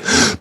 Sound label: Beatbox Mixed, Human Beatbox; Vengeance Samples; Special Sounds